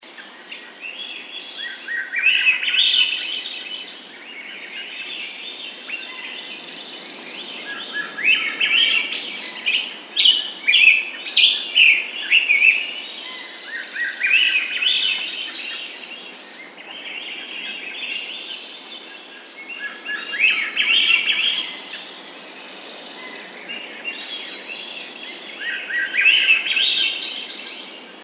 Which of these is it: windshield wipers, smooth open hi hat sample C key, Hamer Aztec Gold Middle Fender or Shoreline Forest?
Shoreline Forest